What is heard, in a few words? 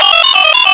beep boop; hl1 npc; rgrunt